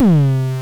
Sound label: FM Kicks Distortion C3; Drums; LookIMadeAThing Kicks - Vermona Kick Lancet